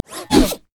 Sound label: steam golem basic attack; LoL; blitzcrank